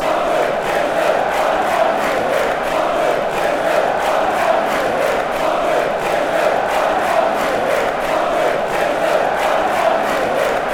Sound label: give us wave; pardew